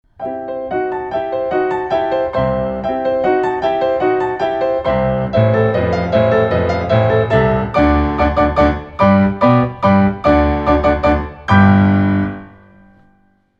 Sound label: Waltz; Schubert